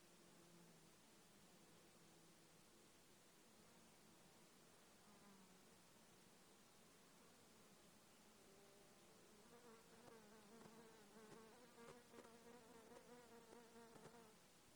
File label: Buzzy Insect Wingbeats; INSECTS; Alaska